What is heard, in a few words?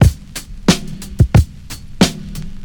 90 bpm drum groove c sharp key